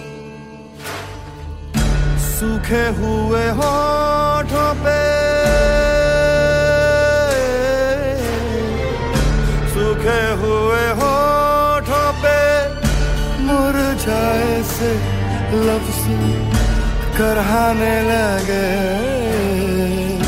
Bollywood Ringtone

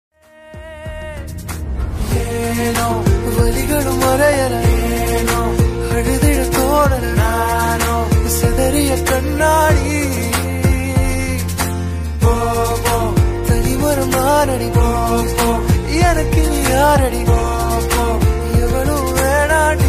Sad Tamil Song Ringtone; ringtones

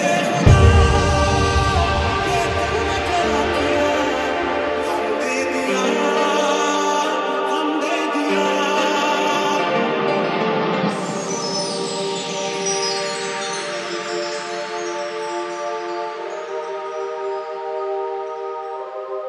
Sad Hindi Ringtone

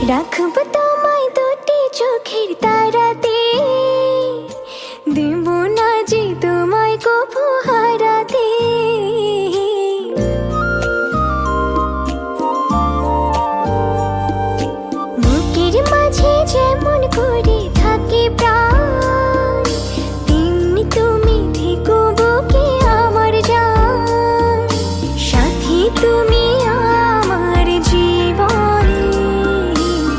Bengali Song Ringtone

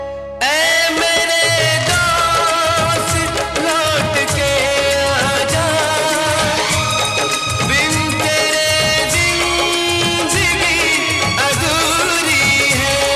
Sad Friendship Ringtone

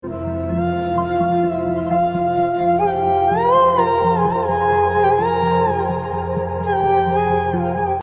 Heart Broken Instrumental Ringtone

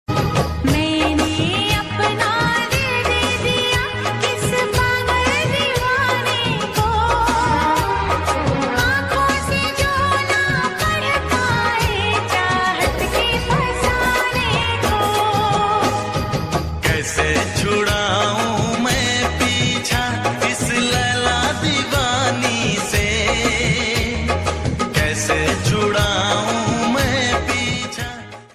Old Ringtone

